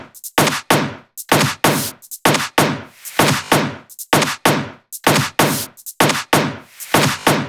Loops 128BPM, Drumloops

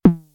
snare, DL-909

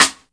STICK, Drum 1 Dry; East Collexion

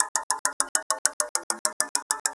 Bike Wheel, Acoustic Beats; Sonic Foundry (Sony Creative Software); Myths of Technology

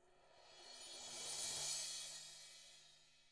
swell; ILIO; Double Platinum Drums 1

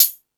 Rap Session, Drum Machines E-MU Pro-Cussion

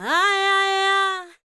Female Vocal 100bpm G Dry, African Voices; Quazibeat; African Secret

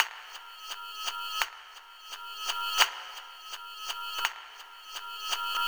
echo, Drum N Bass; Sonic Foundry (Sony Creative Software); Knutrix Drum & Bass

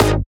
Stab; neuro; InsideInfo

